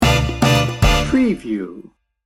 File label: salsa montuno sting bumper